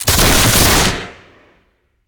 Musket; Sound Ideas; Guns

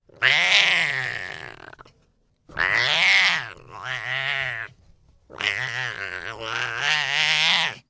Tiger Cub Growls; Tigers; Sound Ideas

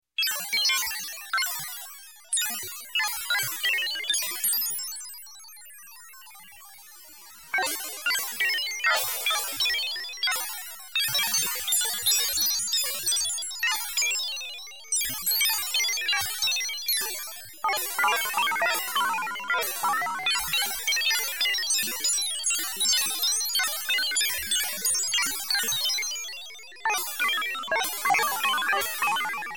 Space Robot Talk, Electronic; Sound Ideas 2000 Series

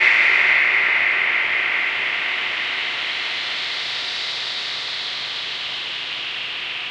NOIS; XXL 800 Production Effects

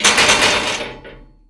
Gate Metal Rattle Locked; Doors

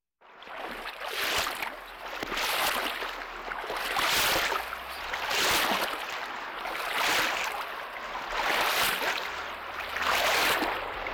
Walking Machinery Trucks

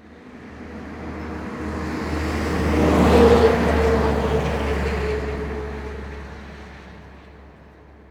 bus driveby fast; best service Blue Box

